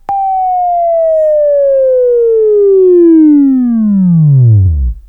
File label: WAV Right, Sweeping; Sweeping Training program